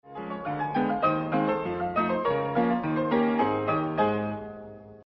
rag piano roll; james scott

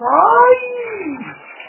aiiiiiii

